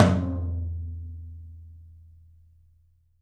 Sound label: open tom; 3D Sound; 3D Drums; 13 TOM